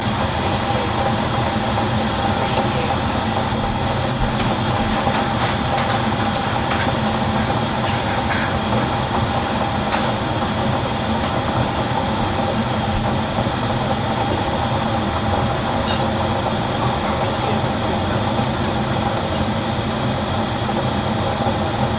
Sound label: Boiling